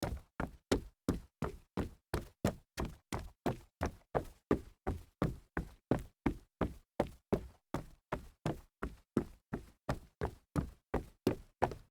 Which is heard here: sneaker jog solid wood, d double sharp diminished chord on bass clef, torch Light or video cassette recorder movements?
sneaker jog solid wood